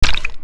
splash, footsteps

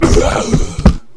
death, skelebot

